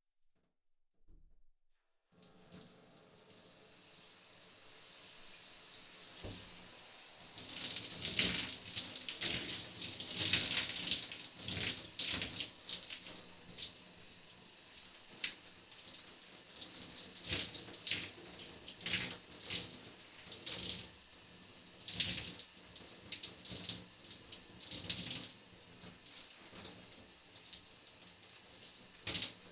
シャワーで体を流す; 3DIO; お風呂に関する音

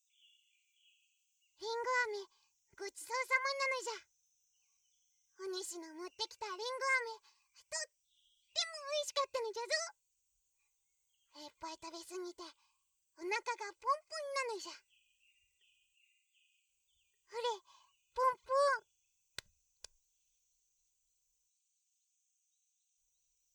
ひまわり様の耳かき; ひまわり様の寵愛

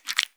くちゅ音単発

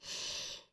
ブレス ミディアム

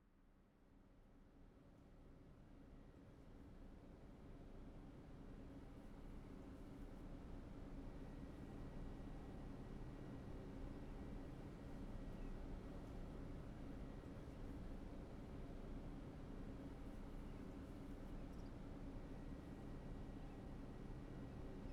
左の 波音 耳かき（歯磨き。波音のする耳かき）; まことこま

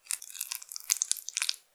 普通, 挿入or引き抜く

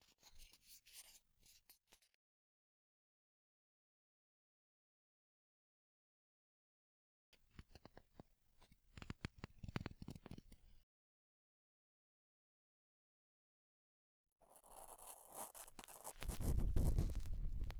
(右)竹耳かき 耳奥ガリガリ音; 耳かき音部位分けVer.

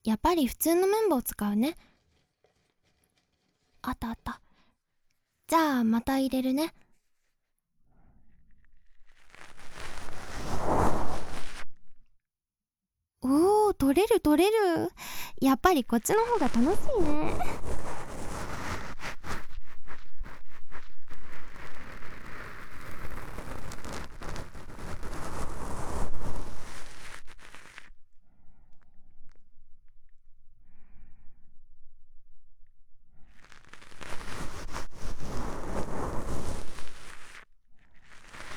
普通の綿棒 左

